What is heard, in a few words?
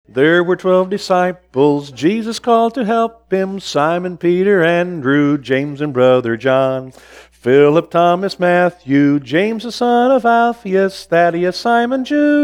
Disciples Song